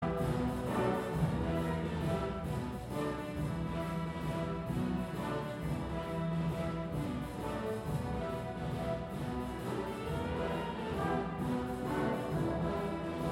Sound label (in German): Orchester; Musikdarbietungen bei der Kunstnacht